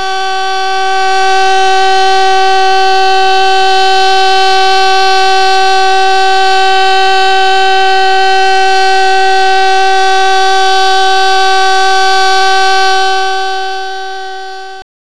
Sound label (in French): alerte fin